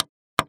Technology Electronic Machine Wood Record Player Button